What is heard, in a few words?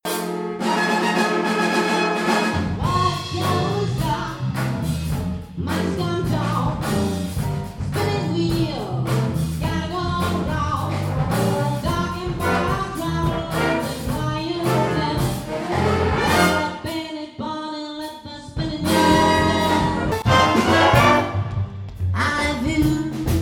BigBand MIX